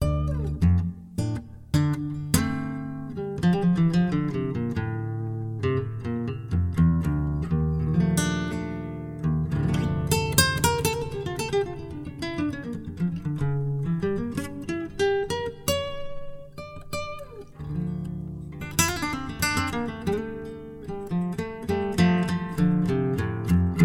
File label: Acoustic Love Story